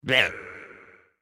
Effort Tiny

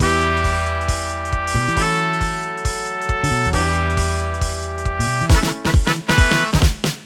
Boom City Boogie Intro

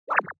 Enemy Slime Crush

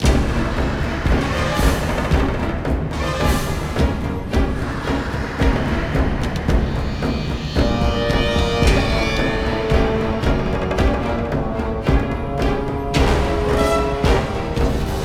Boss combat; The Hobbit; PS2

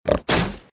Arrow; Aporkalypse Pigs of Doom

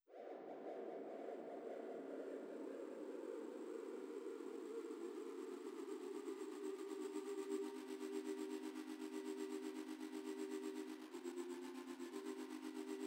Pads 120

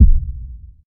Kick; Cobalt Core

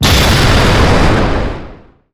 Enemy Masquerade Active Hit; disney star smash android ios mobile gamerip 2020

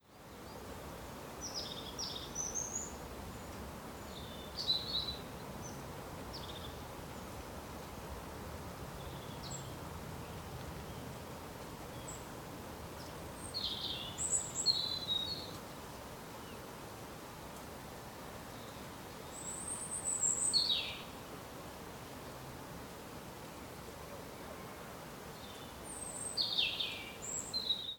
Molokai Bird single forest

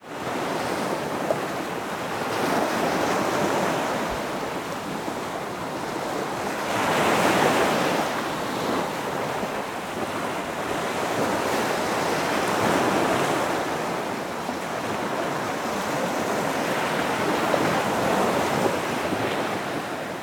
Golden gate bridge Surf

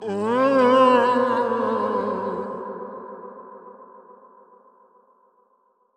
Toxic Mask Roar

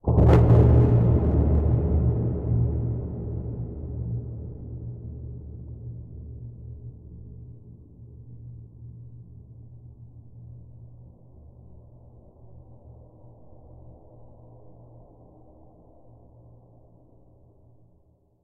Stinger; GreatBoardroom

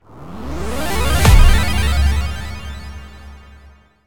Boss Cloud Enter Stinger; Avie

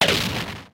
Bomb

